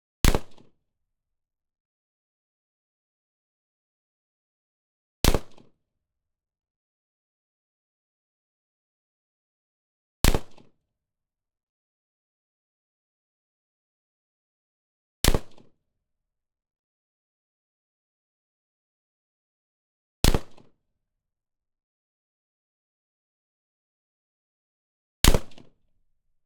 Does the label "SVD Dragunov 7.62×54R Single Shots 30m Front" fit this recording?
Yes